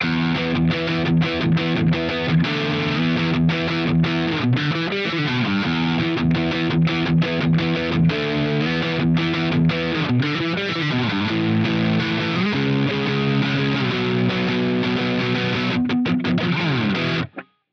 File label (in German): mid gain mitte, gitarre; esp ltd mh 1001 nt